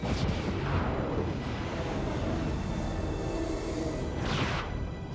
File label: Wormhole Open; Trek